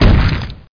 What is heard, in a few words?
vorp blst, weapons; Hexen2